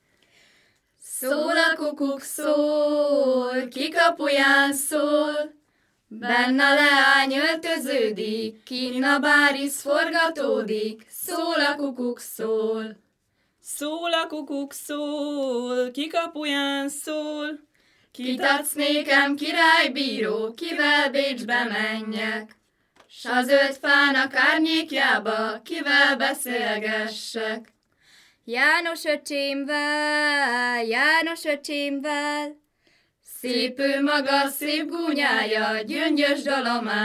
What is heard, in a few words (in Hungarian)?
nepdal; szol a kukuk szol; nepdalok